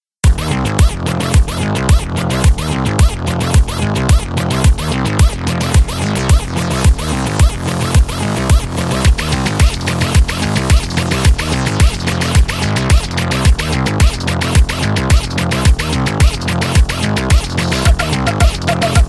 Theme BGM Ringtone; Jagame Thandhiram Movie

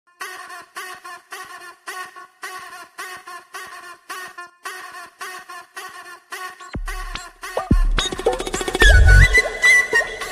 Song Ringtone; Mirai Movie

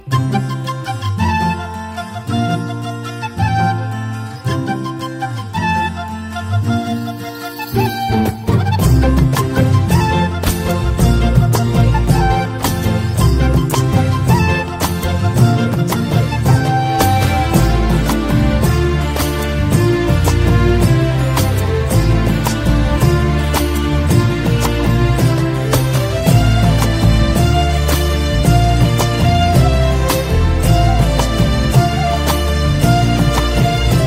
BG Tone; Nava Manmadhudu Movie

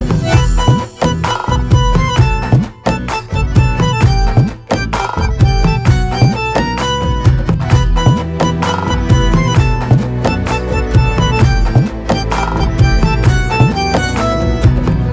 Instrumental Ringtone

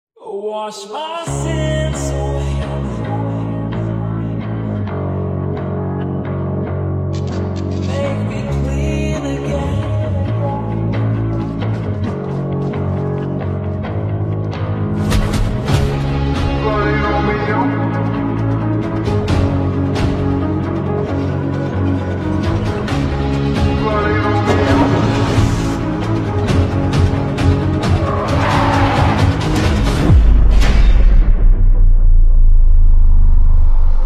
Theme slowed reverb; Bloody romeo; Nani32

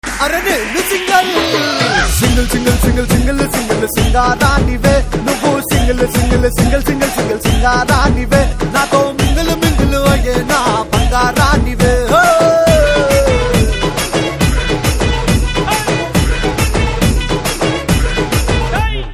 Folk Ringtone; 90ML Movie

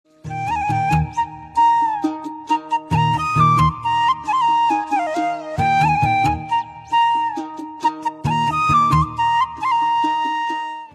BGM Flute Ringtone

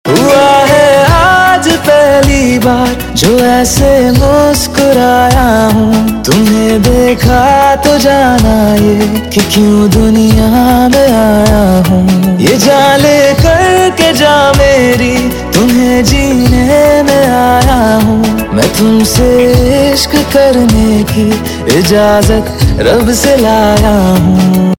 Ringtone; Sanam Re Movie

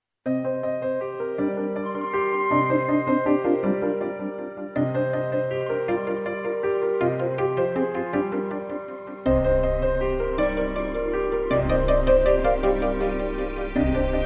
Piano Tone; BG; Tamil Ringtones; 36 Sec; from Amara Kaaviyam Movie

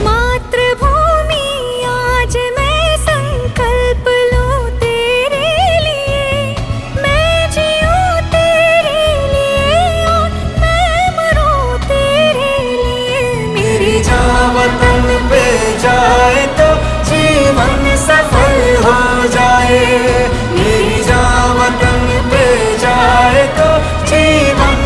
Ringtone female; Battle of Galwan

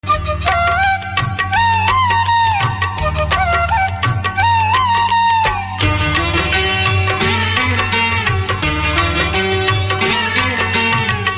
Flute Ringtone

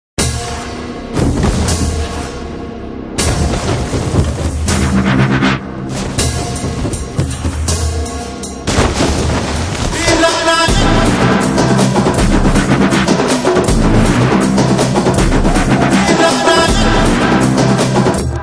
Theme Drum Beats Ringtone; Telugu; from Bheemla Nayak Movie

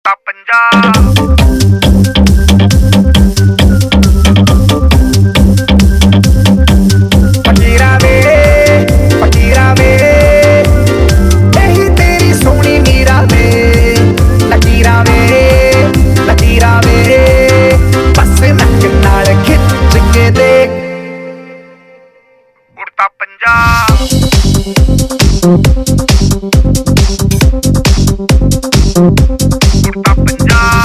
Ringtone; Udta Punjab Movie

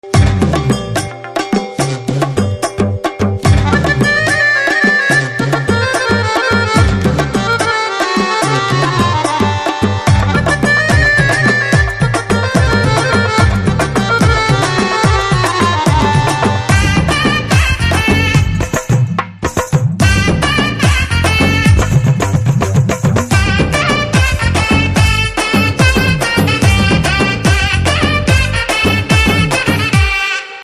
Drum Beats Ringtone; Narappa Movie

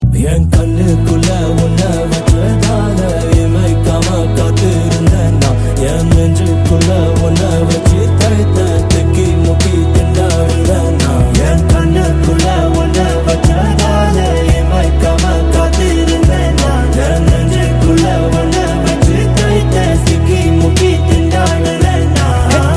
Ringtone; Vanamagan Movie

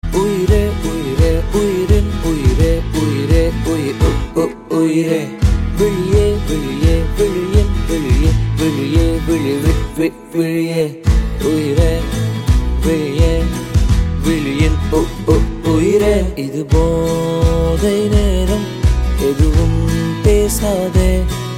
Male Version Ringtone; Thanga Magan Movie

